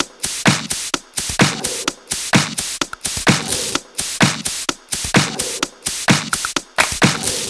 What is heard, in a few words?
Electro Loop 128 BPM; Vengeance Samples